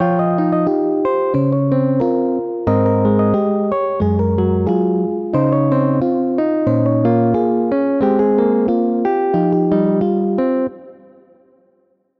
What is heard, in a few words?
Hybrid Advanced Jazz; midi wizard